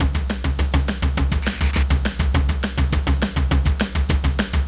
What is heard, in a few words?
Programmed Beat; Sonic Foundry (Sony Creative Software); Crimson Blue and Fabulous Horncraft 4 RnB